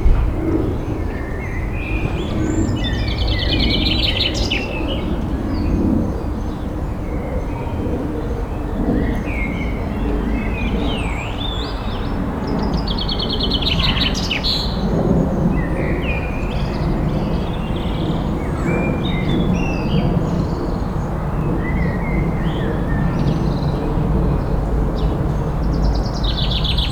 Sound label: City Garden Birdsong; Animals